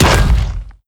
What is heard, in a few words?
step roof; creatures; strider; seq passby